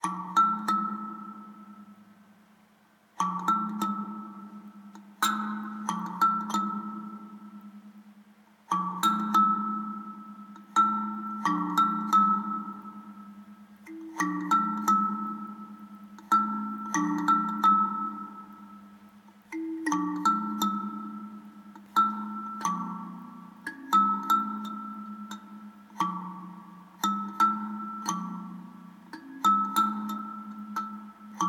Loop Neutral; the walking dead michonne 2 give no shelter

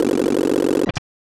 Dice; Super Double Yakuman 2; SNES

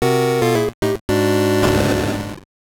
Jingle; mahoujin guru guru yuusha to kukuri no daibouken; sgb

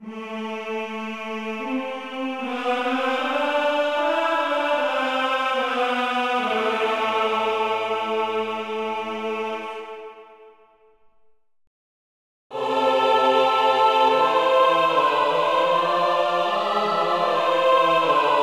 Agony Choir; Lock's Quest; THQ; Nintendo DS

